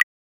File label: click